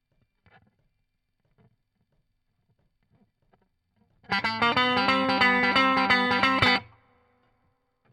Guit Solo, RNR for piano

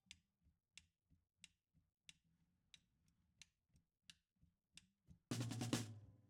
SNR BTM

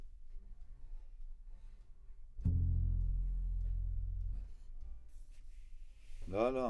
CBass Bot

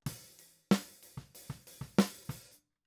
Snare

